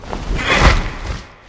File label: blade peryton attack